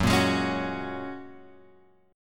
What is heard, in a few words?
F sharp Major Add 9th; F#add9 Chord {2 1 X 1 2 2} strummed